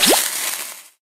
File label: Acme Box; acme rocket dash